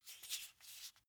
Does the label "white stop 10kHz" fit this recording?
No